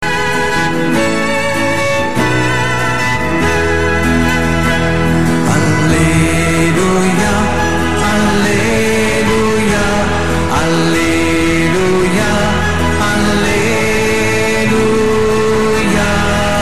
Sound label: aleluja